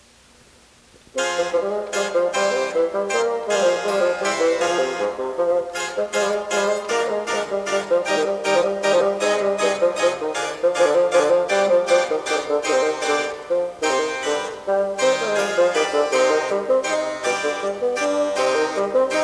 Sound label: Bassoon Sonata; Telemann; Bassoon Sonata 4